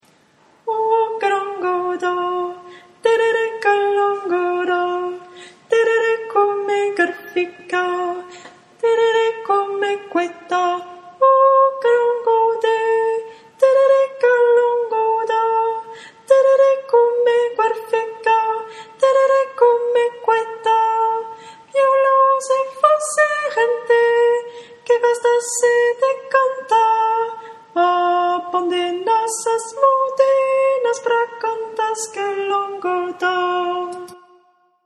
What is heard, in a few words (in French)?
sopranes; calango